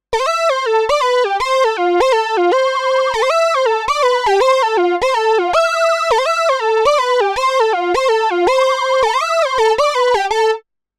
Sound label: Monopoly Spooky Solo